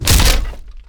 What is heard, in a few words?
wood crack impact